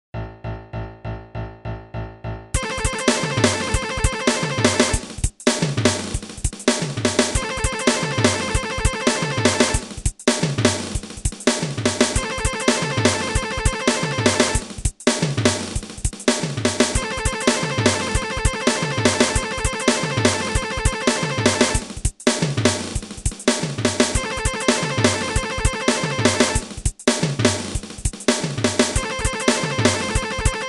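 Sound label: Guitar riff, 200 bpm; speedpick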